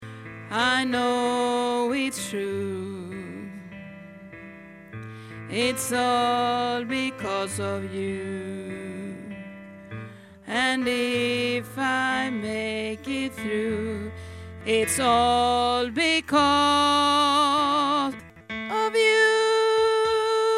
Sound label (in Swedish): alt, stammor